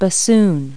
bassoon; Multi-Lingual Talking Picture Dictionary

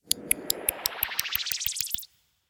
clock; Dino and Aliens

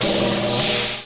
repair; Virus

